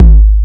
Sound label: SMOOTH 808, 12-Bit Drums; E-MU Formula 4000 Series Vol. 1; Hip Hop Nation